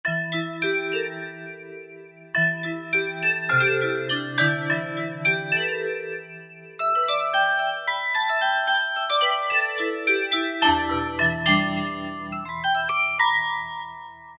Glass Vibe; Generalmusic S2R

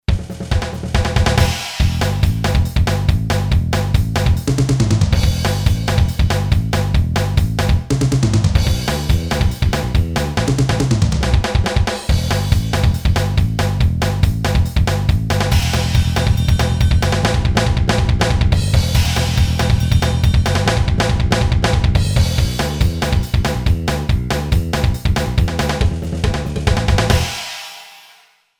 FAST ROCK, Boss DR-3

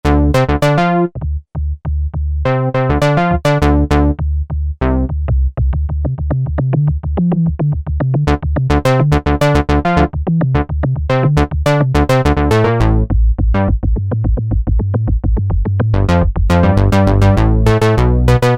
2-way Bass, Roland SRX-07; Roland SRX-07 Ultimate Keys

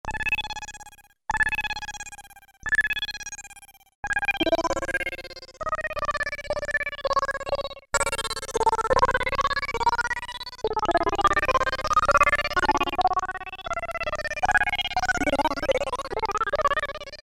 WATER WIGGLE, Oberheim OB-8; Factory Patches

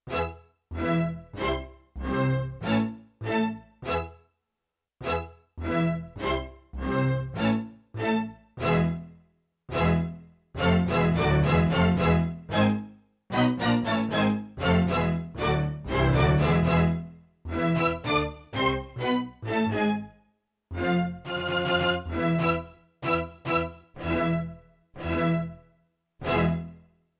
Stereo Hit Strings, Orchestra Hit; Orchestra Hit 2

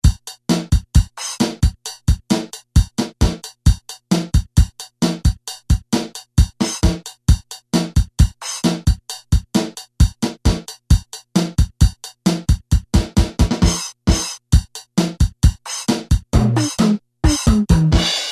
Pops, Casio CSM-1, Rhythm Patterns

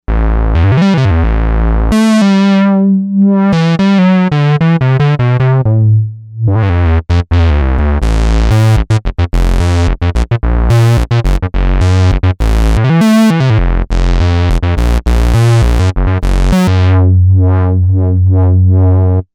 Square Pulse; Novation UltraNova; Factory Patches

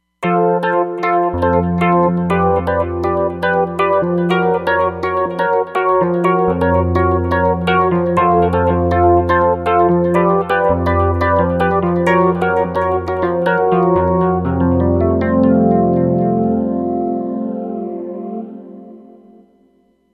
Roland Fantom XR, Smooth Synth

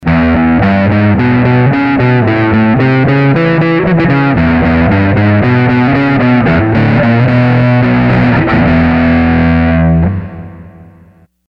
School Plexi, Sony HR-GP5

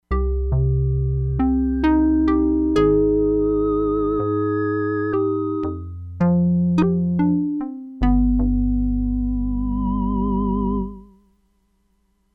DELAYED GHOST + PULSE DECAY, Crumar Bit 99; Factory Programs

